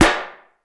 puffy snare d key, snare drum sound tuned to the D note. Loudest frequency: 1656Hz; snare drum samples; drum single hits one shots